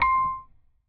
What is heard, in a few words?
Bell C4